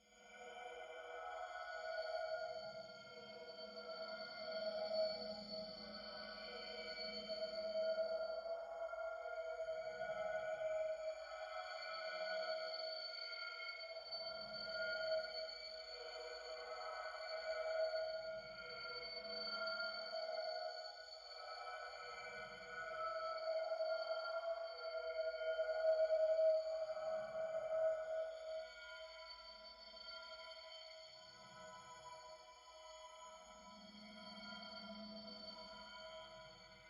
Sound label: alien breath, science fiction